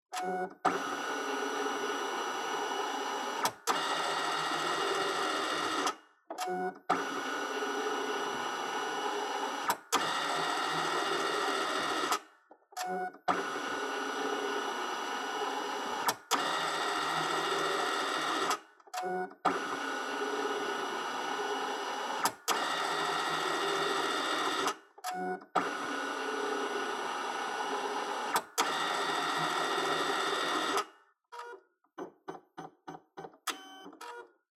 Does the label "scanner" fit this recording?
Yes